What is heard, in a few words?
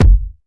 kick drum single hit F key, Royality free kick drum tuned to the F note